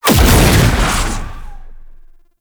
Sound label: Summon Clone; Warframe; Uriel; Demonium